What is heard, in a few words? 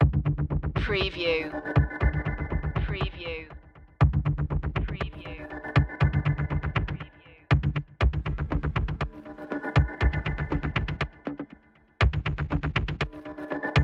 incoming menace underscore, ambient, dark atmospheric